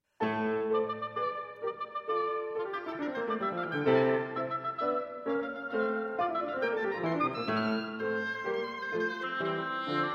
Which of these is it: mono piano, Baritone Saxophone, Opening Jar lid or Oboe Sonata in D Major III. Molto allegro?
Oboe Sonata in D Major III. Molto allegro